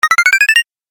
Game App Power Up; video game; Video Game Sound Effects

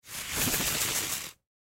Rope Spin Twirl; Rodeos; foley; Blastwave

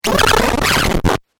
Crazy Glitch 8Bit Style; Video Game; Video Game Sound Effects

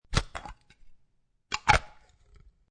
Jar Of Jam Or Peanut Butter Open Then Close Jar; Food Prep; Lynne Music

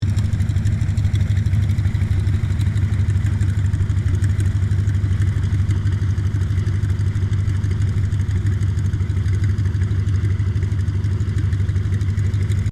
AIRCRAFT CA-28 CERES IDLE; Airplane; Airplanes; Vehicles

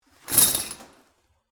Shower Curtain Open; Household; Locker, Room; Bathroom; BlastWave

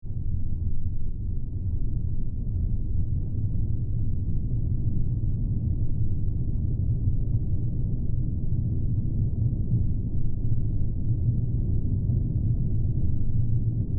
LFE Rumble Active Ominous; Miscellaneous Impact; Sound Effects:Impact; Big Room Sound

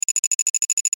Data Loop, Digital, Alarm, Buzz, Static; Multimedia; Production Element, Imaging Element, Accent, Transition; Sound Design; Blastwave

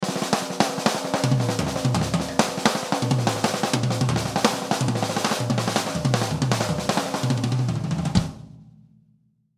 Drum Solo Snare, Drum Solo Snare Toms; Drum Kit; Blastwave

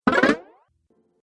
Cartoon Game Fast Vibrating Jump; Bounce Sound Effects

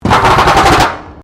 METAL BIN SCRAPE CONCRETE

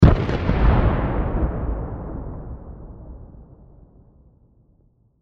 Explosion Blast Black Powder Large; Distant; Military; Warfare; Blastwave

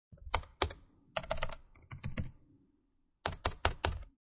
Hits on a computer keyboard; Computers; Sound Effects:Electronics

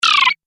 Arcade Game Very Fast Beeps; Video Game Sound Effects; Retro Inspired Sound Effects - Think Nintendo, Atari, C64, Etc.

